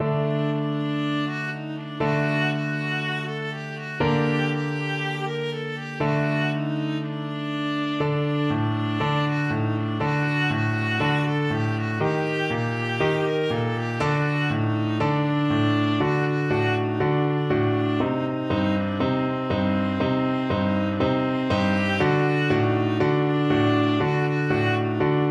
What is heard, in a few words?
hava nagila, viola